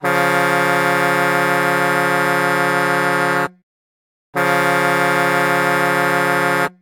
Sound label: Hockey Goal, Hockey Horns & Buzzers; SFX Kit; StLouis